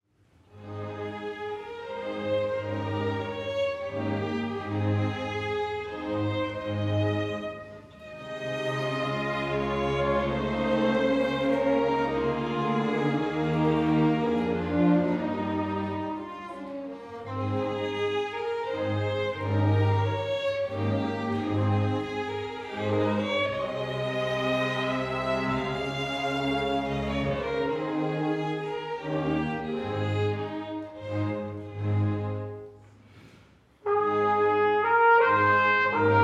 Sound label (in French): concerto pour trompette 2e mouvement